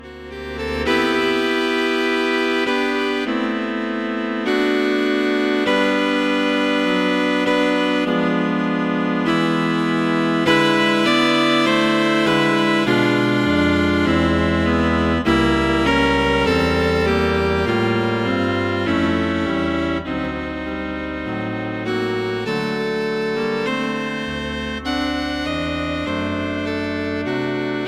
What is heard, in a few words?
Cello Quartet; blues and cool jazz; Not too difficult.